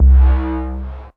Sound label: Prodigy Punch Verb Vinyl Synths C1